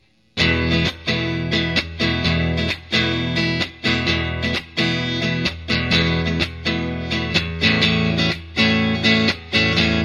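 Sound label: Lightning Rhythm Clean; Angeltone Lightning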